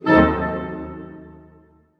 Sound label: harp hit D# DM; Sound On Sound - Peter Siedleczek's Orchestra